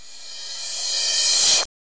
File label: Cymbals Reverse Crash; Vengeance Samples